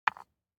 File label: DICE on Wooden Tray, Drop, Standard, 2 Two Dice; Samples; ArticulatedSounds; DICE Sfx Party (Extended)